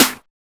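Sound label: NEWSTYLE SNARE; Drums In Your FACE!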